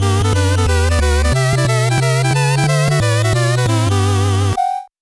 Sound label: gameover; panicpumpkin